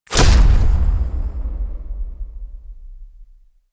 Mech Fall Land; Nechro

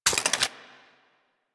Rifle Reload A; Sundial

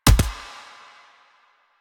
Pistol Punch; Weapons; DE; Warframe; Tenno; Lasrian

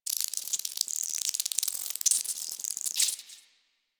Drifter Camp Rock Critter, Creatures, Ambience; One Offs; Warframe; Quests; The New War

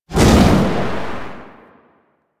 Void Dragon Firebreath Stop; Enemies; Abilities

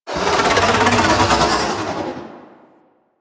Baby Dragon Vocal Idle; Enemies; Warframe; Duviri; Void Dragon

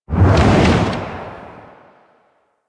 Reaper Sprint Whoosh; Warframe; Wraith

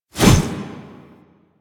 Hover Whizzby; Enemies; Deco Hover Whizzby Envy; Warframe; Warframes; Pax Duviricus

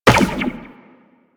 Run Zipline, Footsteps; Warframe; Tenno

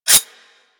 Whip Mech Rotate; Grineer

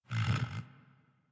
Tame Interact Sleep Snore; Enemies; Warframe

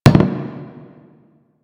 Metal Pipe Drop; Ambience; Props; Warframe; NineteenNinetyNine

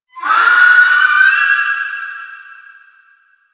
Infested Distant Creature Cry; Warframe; Corpus Infested

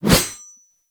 Sword Swipe, Melee Whooshes; Warframe; Weapons

